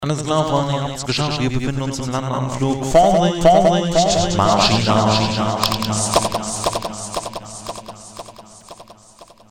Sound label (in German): Jingels